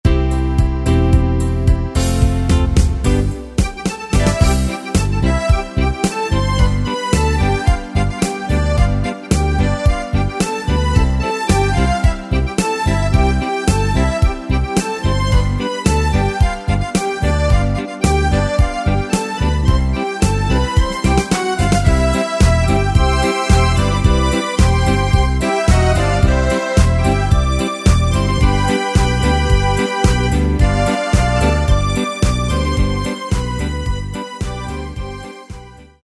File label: Dnce Bird, Yamaha YPT-400